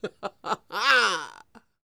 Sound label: LAUGH